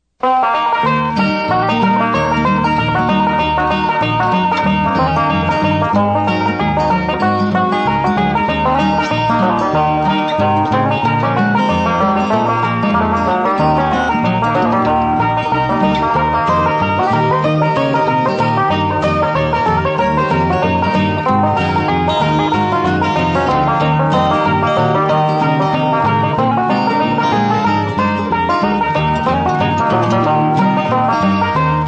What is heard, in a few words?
Worried Blues, banjo songs